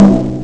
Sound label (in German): pauke; Amiga Soundtracker Sample Packs